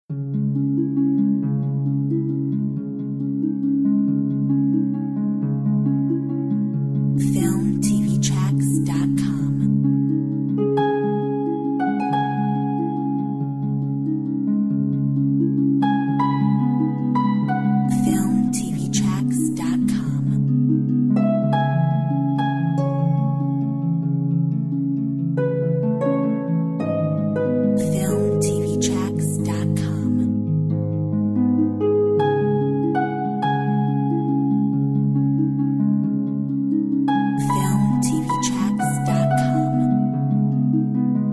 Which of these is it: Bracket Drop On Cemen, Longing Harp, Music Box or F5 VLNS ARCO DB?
Longing Harp